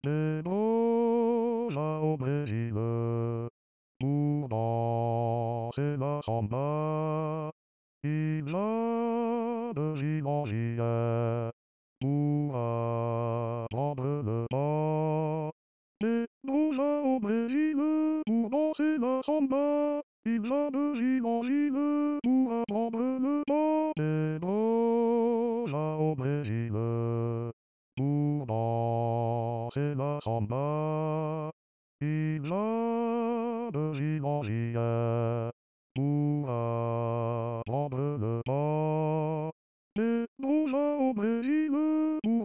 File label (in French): hommes